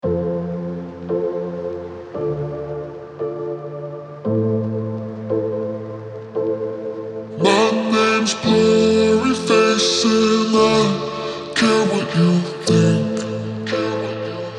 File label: Slowed Reverb